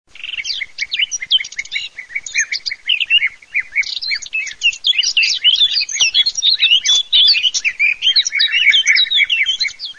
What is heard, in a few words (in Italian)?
CAPINERA GRUCCIONE